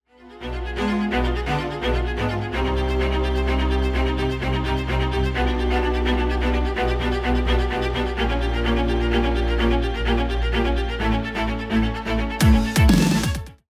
choose team; Outlast

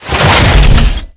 Stinger Rotate; Requests Studio; UT3

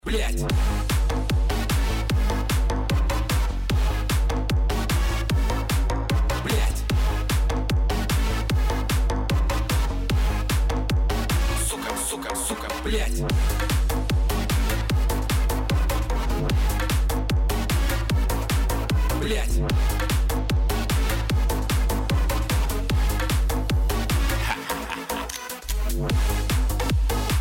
bomb planted; music kits custom

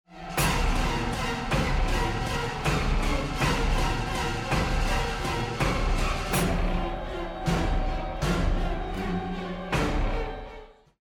bomb ten sec count; Outlast

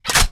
Sniper Rifle Mag Out; Requests Studio; UT3

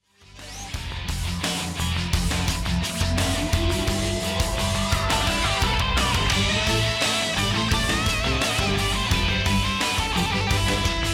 bomb planted; music kits custom

